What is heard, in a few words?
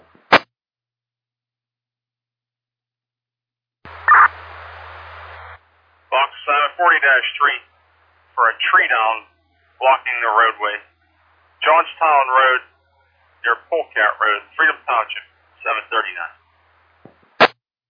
page received